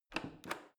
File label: plastic button creak press